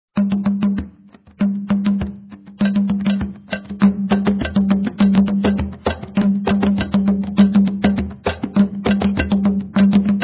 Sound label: kpanlogo, drumming; playalong cd